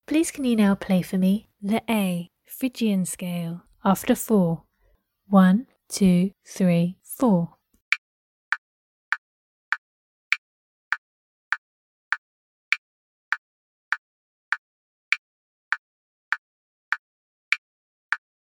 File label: phyrgian scale